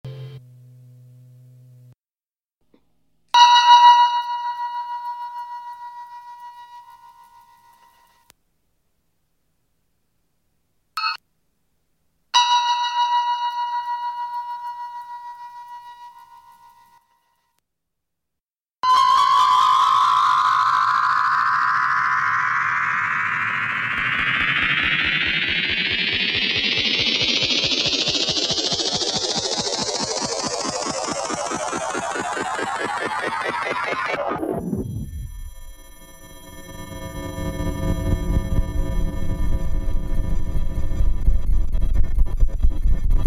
guitar sample

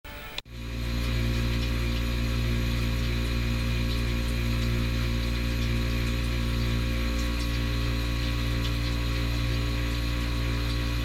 Fridge Buzz

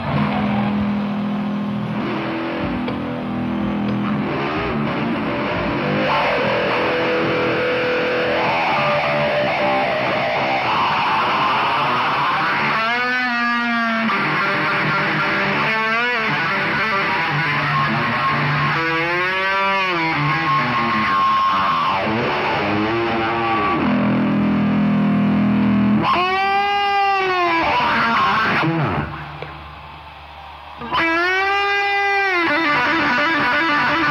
Bass Solo, Live; Live At Hampton Coliseum, Hampton, VA

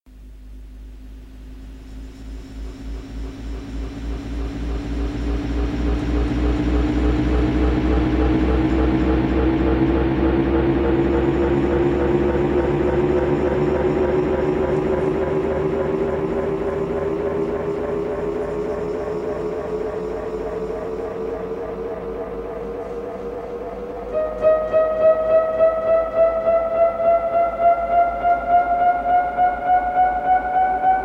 AMS Delay

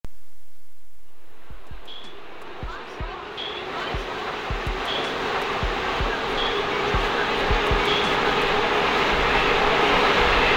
Ambient Loops

